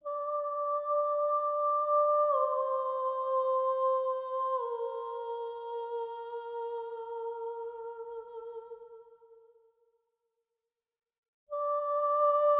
singer solo, soundtrack; lethians crossing; Tyranny Gold Edition

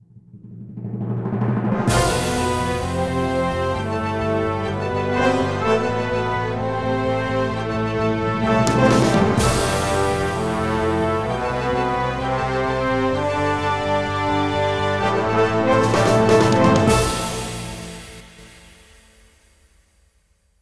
Complete; Emergency Heroes; wii

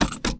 Stereo Cassette; Five Nights at Freddy's The First Generation

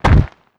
walk dirt; gamerip; shattered horizon 2009

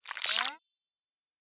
Candycaneblocker Stripe added; Candy Crush Soda

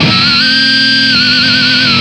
Guitar Scream

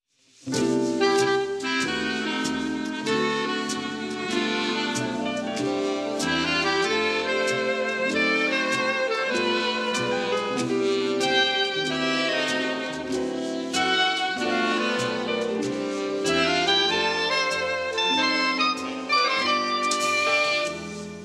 Orch Moonlight Romance; the movies 2005; Clean Silent

